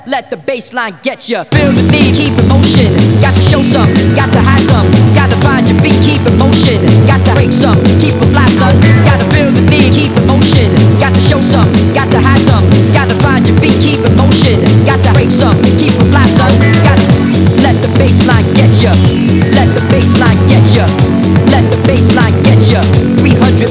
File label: Basstrap